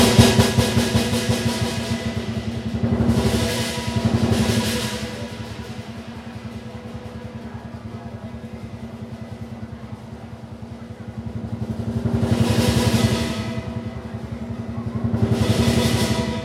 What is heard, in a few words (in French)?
Singapour combats de lions